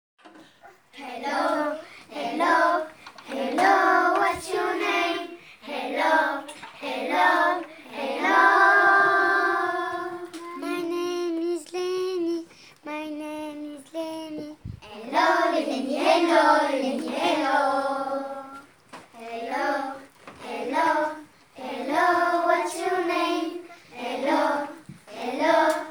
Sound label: Vocal